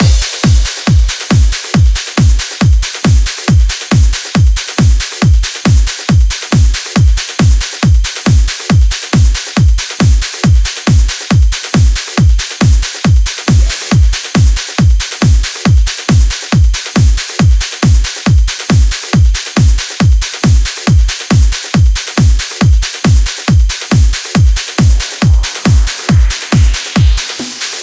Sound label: Drum Mix 138BPM 16 bars; Drums & Perc; G#minor; Vengeance Samples; Manhattan Kit